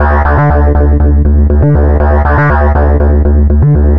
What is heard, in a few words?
Synth Bass